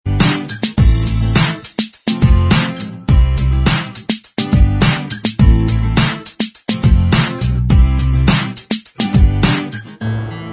Inst Track

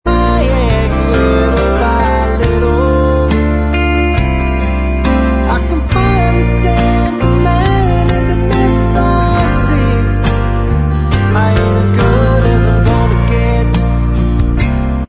Bvox Track

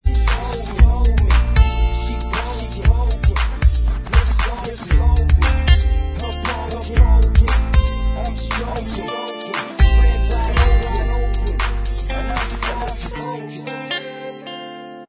Bvox

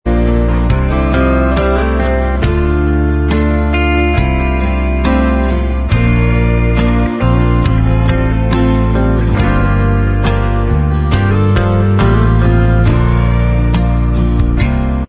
Inst Track

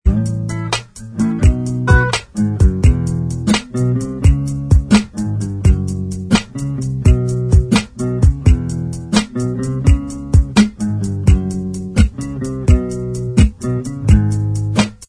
Inst Track, backing tracks

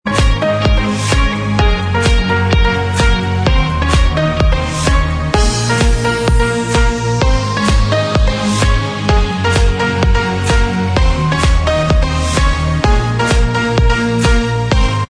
Inst Track

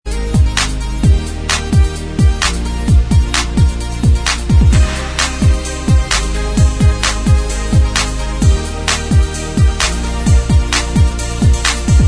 Inst

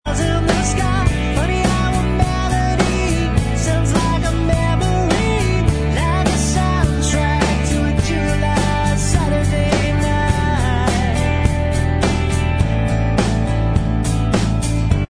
Bvox

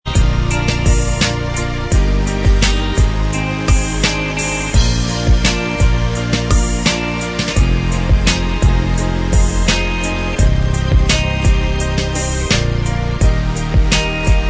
Inst

